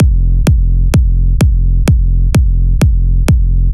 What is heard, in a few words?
Big EDM Kick Loop, 128 BPM; Future House Tools